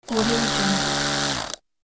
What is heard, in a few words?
motor small vibrating slow speed